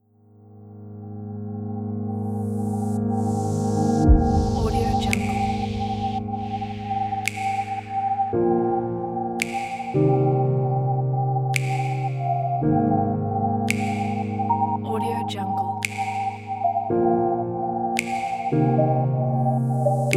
abstract ambient technology

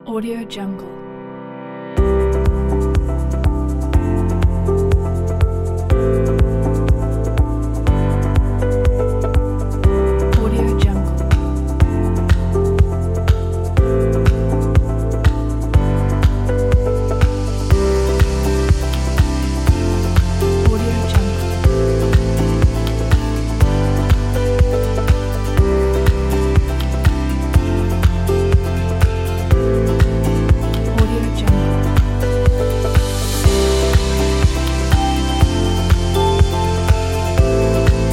motivational background

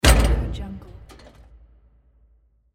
mech robot footstep

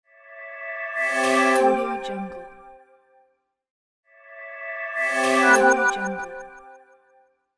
jingle logo

